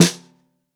Snare Bottom; Ableton Foundation FreePacks; Snare Designer

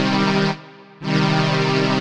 Solina Brass Section, String Machines, ARP Solina; Ableton; Factory Packs; Retro Synths

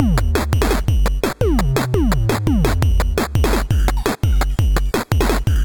170 Beat, Drum Machines; Fire Spitfire; Ableton

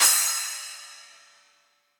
Splash 8 Big Punchy, Cymbal; Drums; Drum Hits; Standard Hits; Ableton; Factory Packs; Session Drums Club

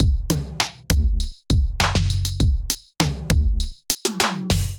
Kit groove 100 bpm, Drum; MIDI Clips; Selector Kit Producer; Ableton; Drum Essentials

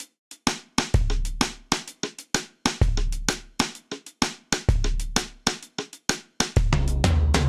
Sidestick 128bpm, Session Drums Studio; OldSchool; Ableton; Factory Packs

